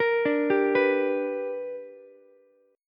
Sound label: B Minor Major 7th Sharp 5th B, Ukulele; arpeggiated; JGuitar